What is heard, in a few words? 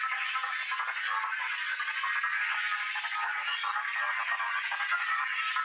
Tonal Re Draw, sparse soundscape